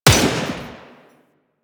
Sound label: Tommy Gun Shot 3D; Weapons; Warframe; Tenno